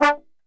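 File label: D 2 trombone shot, Brass; Best Service; Brass Super Section; TRMB SHOT; 90 s Sample CDs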